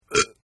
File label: Burp; Burping; Human; Partners In Rhyme